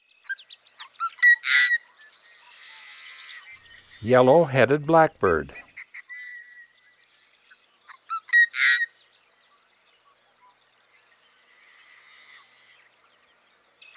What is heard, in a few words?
Yellow-Headed Blackbird; Western North America; Dan Gibson; Wildlife Identification By Sound